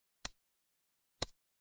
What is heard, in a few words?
ui toggle